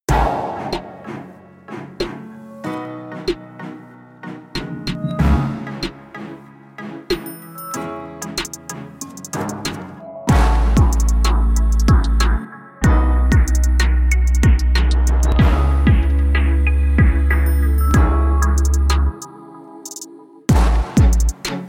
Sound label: Instrumental